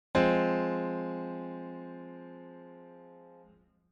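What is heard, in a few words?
minor triad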